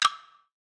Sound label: perc; eddyflux